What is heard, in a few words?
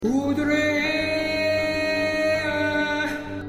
hudreeeeaaaahhh